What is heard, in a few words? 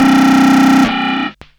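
RUMBLE right, Hard & Loud Techno; USB Soundscan vol.01; AKAI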